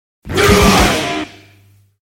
Alarm; Bitcoin

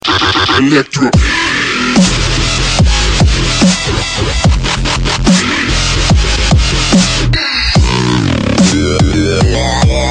Dub

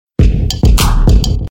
Hip Hop Drums

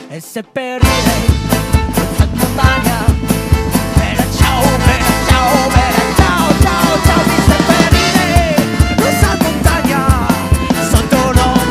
Bella ciao

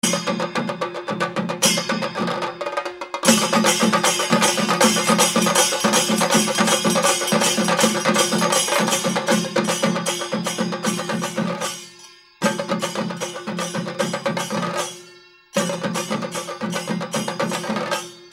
Drums

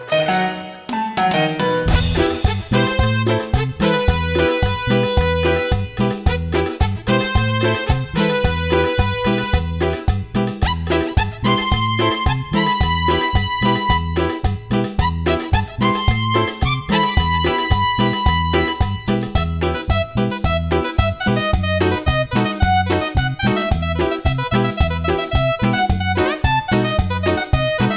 Cumbia; Cumbia de Free Fire

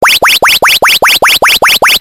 Virus Warning

